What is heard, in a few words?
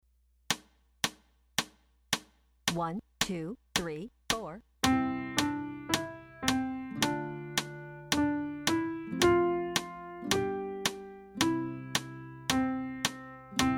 French Folk Song